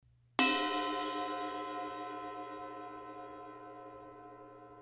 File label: A Avedis Bell, cymbal